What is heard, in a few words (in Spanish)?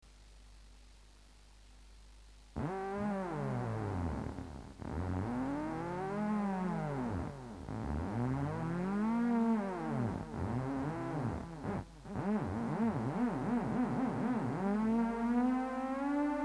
viejo con delay, Theremin